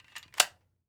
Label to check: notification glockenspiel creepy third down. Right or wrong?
Wrong